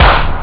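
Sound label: snare drum; ST-XX Amiga Soundtracker Sample Packs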